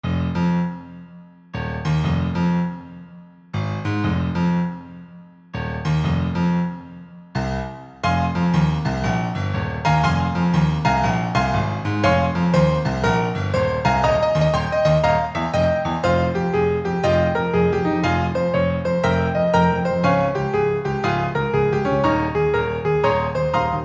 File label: piano